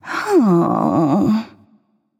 thinking; smite; nox

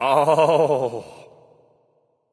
grunt; Smite; bacchus

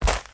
footsteps; smite; god merlin

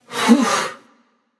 sigh passion; chaac

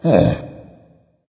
sigh dragon; fafnir

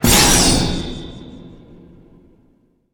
activate; smite; daji

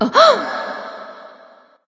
surprised light; smite; voicepack; hel

